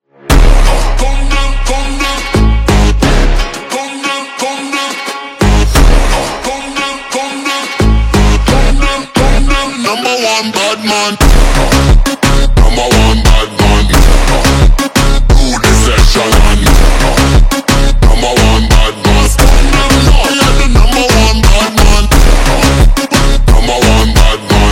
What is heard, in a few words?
Phonk, Ultra Slowed